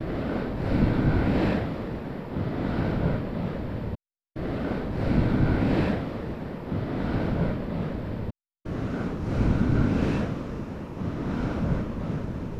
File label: extension wind